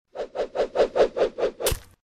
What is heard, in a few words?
axe throw